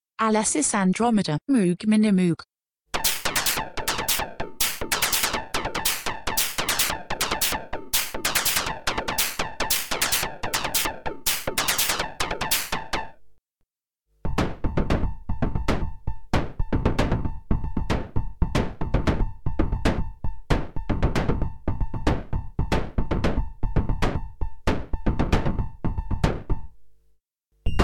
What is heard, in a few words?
A6 Minimoog Sequ With FM